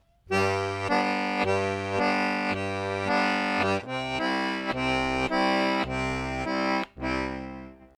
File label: And phrase five; Back Door